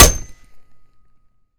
golf hit, golf club; weapons; melee

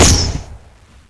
laser on; weapons; lasergun; npc

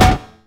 epic metal hard

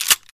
pickup; deathlegends

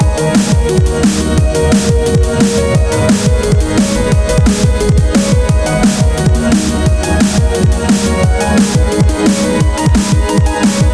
boss battle loop; nh demon

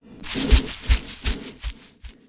forest hidden, creature; stalker

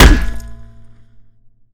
shovel hit; weapons; melee

